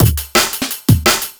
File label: navarone boxy combo 170, Amen Breaks